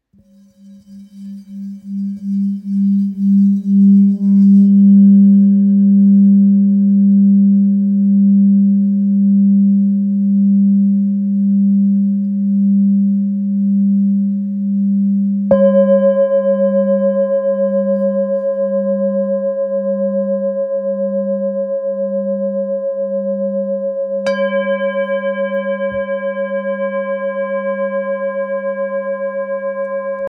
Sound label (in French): Bol ancien sol